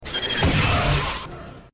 gun; zat

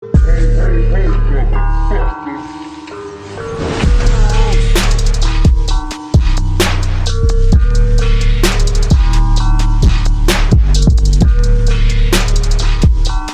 spooky type beat

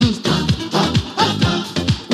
notification

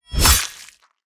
stab

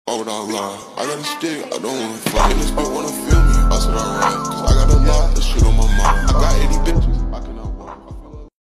beat

